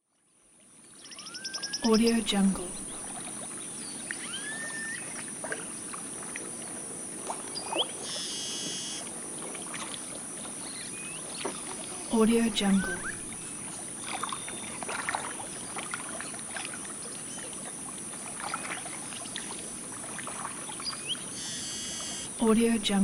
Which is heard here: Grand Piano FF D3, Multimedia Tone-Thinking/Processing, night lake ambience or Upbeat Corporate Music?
night lake ambience